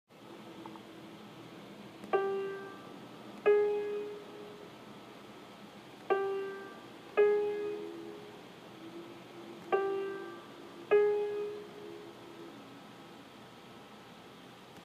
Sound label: Interval